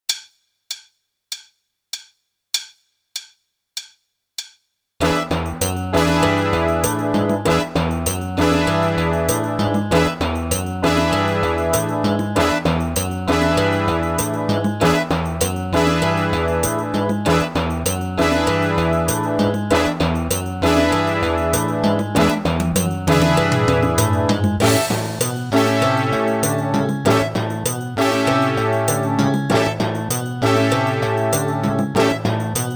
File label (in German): Snare Bass Drum Kombinationen; Meespeel CD